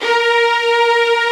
HI ST STRING

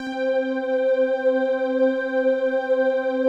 PAD, DIGI-PADS

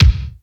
FLY BD, 12-Bit Drums; E-MU Formula 4000 Series Vol. 1; Hip Hop Nation

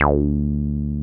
303 D3 f; Drums In Your FACE!

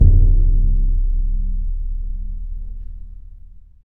PRC CBD, Orch Bs Drum

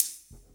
RIM SHOTS; Best Service; Dance Mega Drums

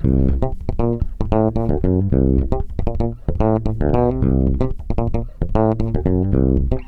BASS 114 C, Bass Grooves; USB Soundscan vol.30; AKAI

